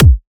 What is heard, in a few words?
Riddim Kick